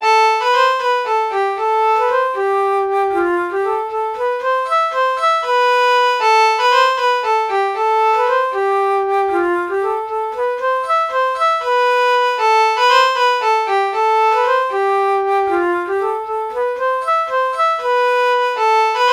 reed organ alto flute melody morph

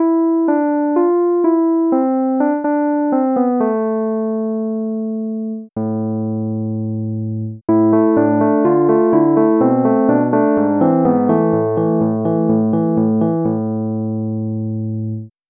rhodes ep; SpectMorph Evaluation #4

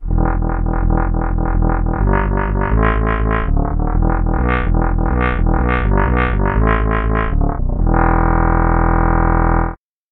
muted trumpet bass samples

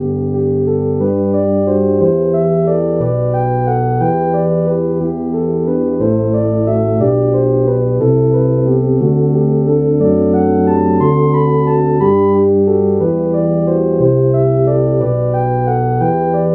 tuba

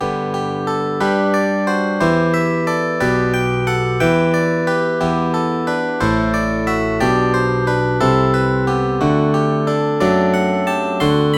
picked bass

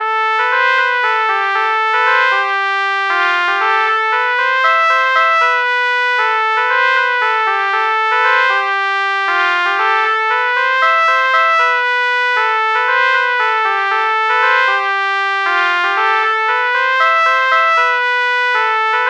trumpet trumpet lpc true melody morph

